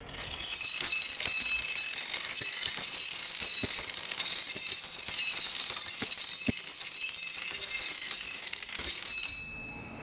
multiple bicycle bells ringing together

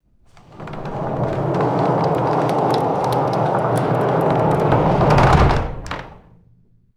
heavy barn door rolling and shutting; doors

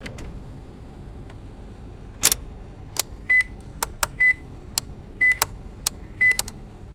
electronic vehicle interface beeps and clicks

